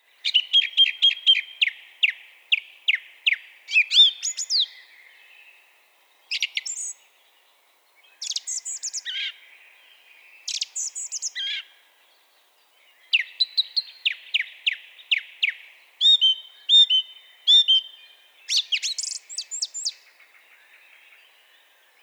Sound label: Singdrossel Turdus philomelos song; Karl-Heinz Frommolt; Vogelphilipp; Vogelstimmen